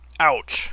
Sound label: pain; twitch-1.0